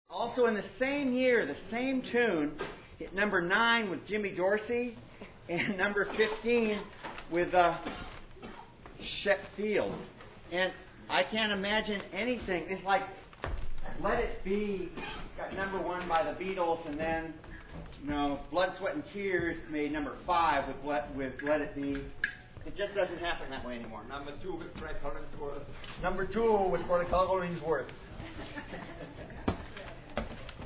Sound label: Accordion Band; Intro; 2009 Concert